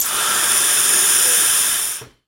Pneumatic Valve

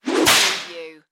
Whip Crack Bullwhip; Sound Effects Bible

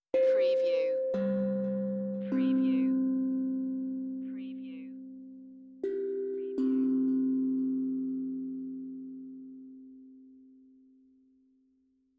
Tongue Drum Meditative Tone; beanstalk audio

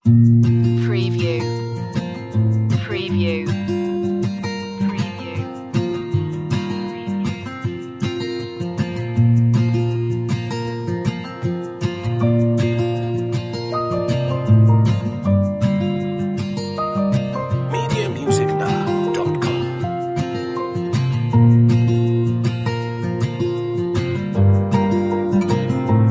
folk indie acoustic

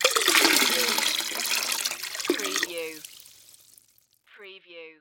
Bucket Pour Into; sound effects bible

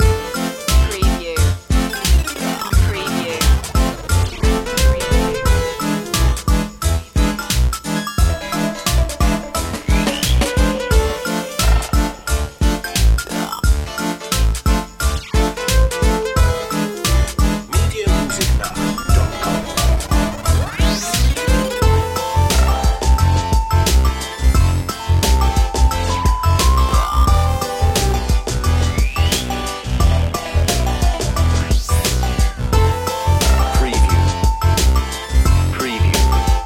retro video game kazoo world long looping

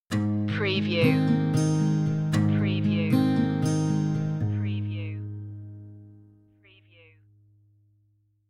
rain and sunshine stinger

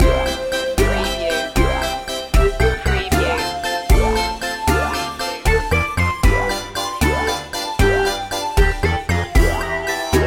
retro video game circus loop

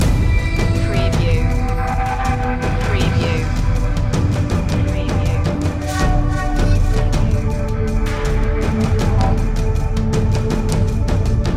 forest fantasy loop

